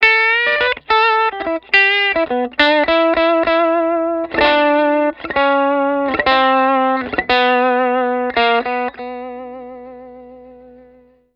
TELE BRIDGE WARM DRIVE OVERDRIVE LICK, t bridge pickup